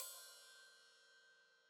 Hat Open, Hi-Hat; Deeply Sampled Drums

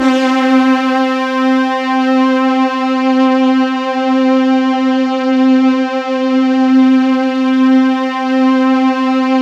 C4, Synth Line

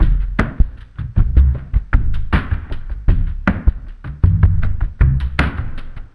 ICE BRUSH REMIX, Liquid Grooves; Spectrasonics; Liquid Grooves 1 & 2

